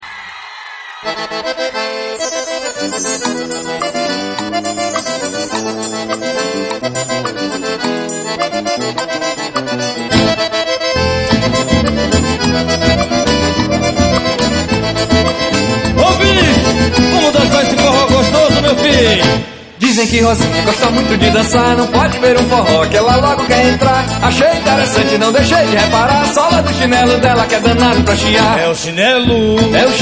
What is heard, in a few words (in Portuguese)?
xote baiao ao vivo